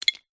food savory snack wasabi pea single drop in ceramic bowl; zapsplat